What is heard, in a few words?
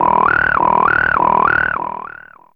Snort, Fairlight CMI IIe; Effects3